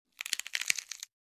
Light bone crack

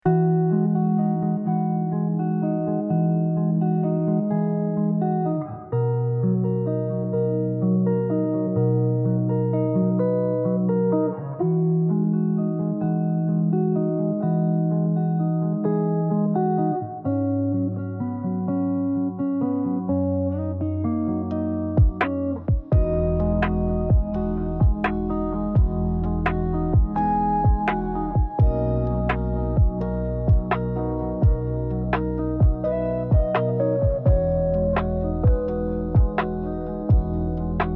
Chill Lo Fi relaxing study beat

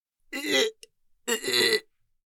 Adult female retching deep groans; Puke and Vomit Sound Effects; Vomiting Sounds

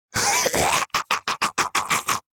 Demon beast imp monster maniacal laugh

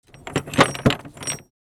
Metal chain lock locking; gfxsounds

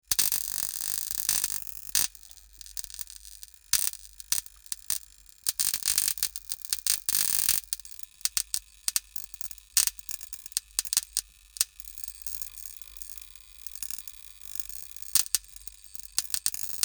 Broken lamp electrical buzz light humming; sound design effects

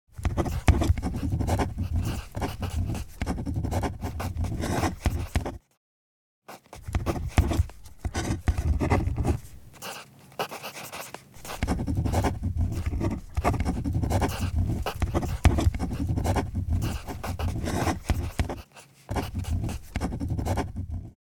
Writing on paper handwriting pen long

